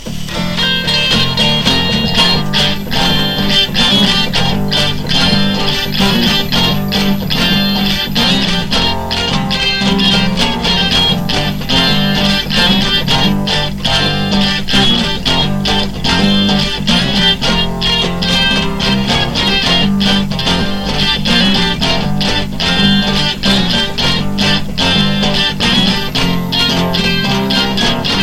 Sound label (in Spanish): base funky